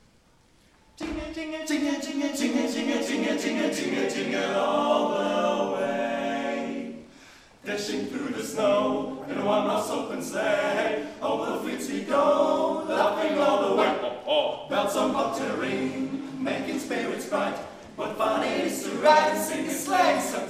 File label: Jingle Bells, Jugendkammerchor; Ahrensburg; Weihnachtskonzert